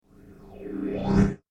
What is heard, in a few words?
wave; Anuken